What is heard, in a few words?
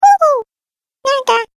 Cartoon Game Voice Baby Sounds; Twistedtracks